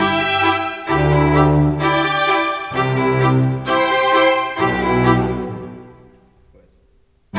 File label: Cinematic Strings, Orchestral; Sonic Foundry (Sony Creative Software); Orchestral Series VOL-3; Cinematic 14